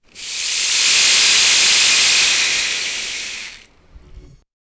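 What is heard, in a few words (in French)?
gonflement rapide d'une batterie, deformation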